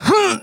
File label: kiai; MonsterHunter; Fight